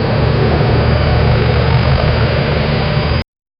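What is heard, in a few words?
winch motor straining cable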